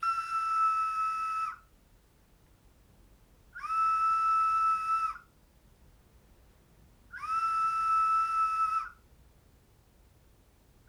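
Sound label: 3 whistle in cell B rec courtyard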